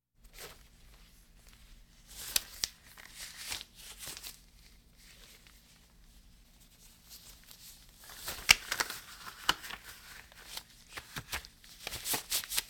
Hospital effects

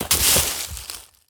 Bodyfall Leaves; SFX Kit; Bodyfalls

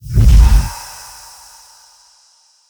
Steam Inhalator; Plastic Surgery

